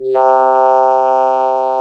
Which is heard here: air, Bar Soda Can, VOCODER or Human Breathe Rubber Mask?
VOCODER